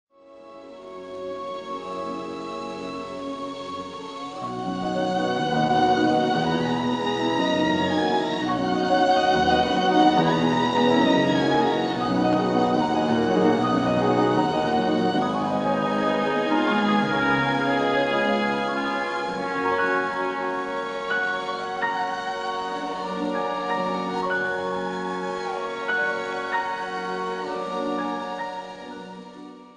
Fantasy for piano & orchestra Lento e molto espressivo; Debussy; Concertgebouw Orchestra